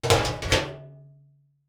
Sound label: Garbage Can Close Lid Metal; Household